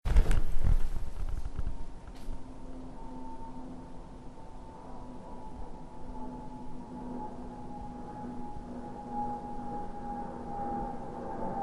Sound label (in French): Enregistrement sifflement A320